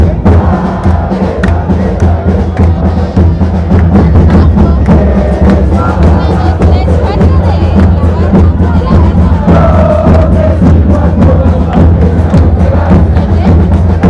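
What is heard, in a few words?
fanchants, football chants; dale dale pumas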